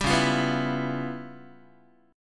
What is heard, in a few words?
C sharp 7th Sharp 9th Flat 5th C sharp Guitar; strummed; 4A,4E,5A,5D,5G#,6C#; C#7#9b5; JGuitar